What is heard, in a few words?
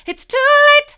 it's too late mid, vocal